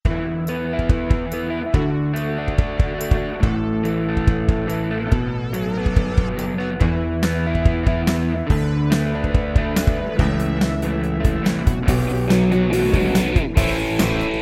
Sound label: Ringtone